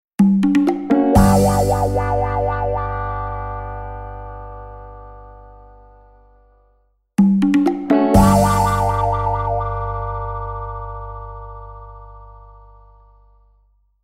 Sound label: JINGLE GUITAR